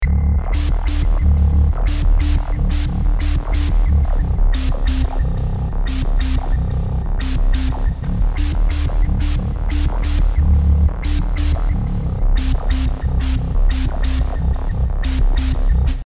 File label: Snap Shot Sequencer; Waves Flow Motion